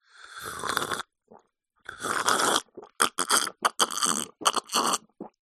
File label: human drink from glass slurp swallow